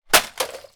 keyboard hit, computers

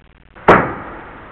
SWITCH

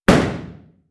shotgun fire; weapons; explosives

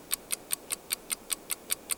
clock tick; minute

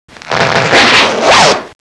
ZIPPER

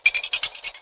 bottle rythm, loops

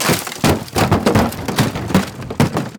wood debris, wood debris crash

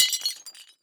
ceramic plate drop; ceramic plate drop and break

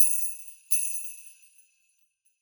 sleigh bells

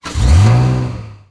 car engine start, muscle car

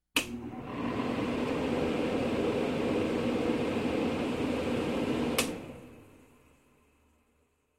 fan on off; electric fan

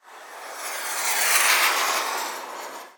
rocket fly by; detonation

